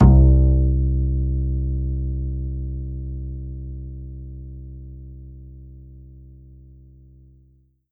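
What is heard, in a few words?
Ensoniq ZR-76 Acoustic Bass C2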